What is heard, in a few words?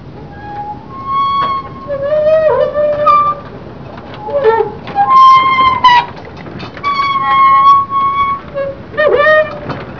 cart squeak